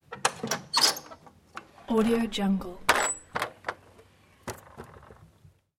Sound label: household shutters window wood open